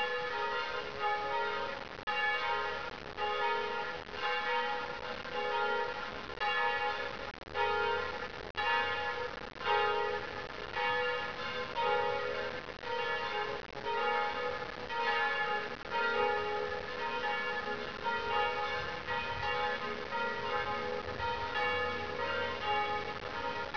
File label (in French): cloches